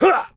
military hit; STALZONE; customnpcs; stalker

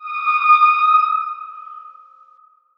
Small ambient metal squeak; STALZONE

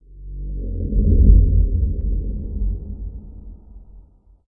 moan, ambient; STALZONE

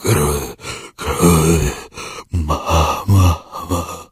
zombie die; STALZONE; customnpcs; stalker

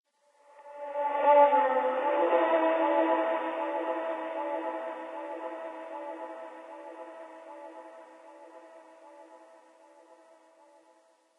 strange sound; STALZONE; minecraft

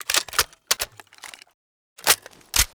aug a3 reload; STALZONE

